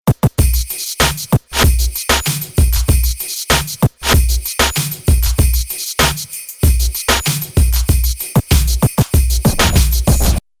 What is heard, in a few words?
Kick Beats; pack noel